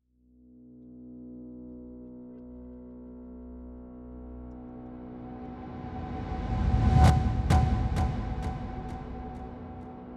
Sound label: tension; bubchong